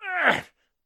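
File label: moan, male; Interactions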